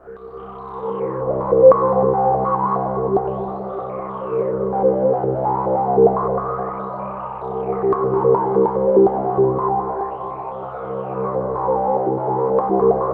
C1, Roland JV 2080 TECHNO PAD

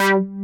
VOCO BASS; Pro-Rec - Synthsonic; Z1 DANCE

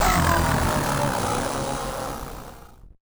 Malfunction Synth, malfunctioning synth samples

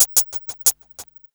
RB 92 H FX LP 1-R, Hip Hop R_n B; USB Soundscan vol.19; AKAI

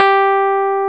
PIA Mj; Best Service Gigapack 1; PIA MKS

